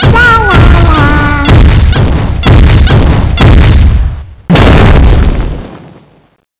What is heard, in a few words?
attack hole; cstrike; Teddy CSO